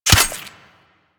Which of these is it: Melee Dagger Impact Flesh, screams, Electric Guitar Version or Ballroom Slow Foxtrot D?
Melee Dagger Impact Flesh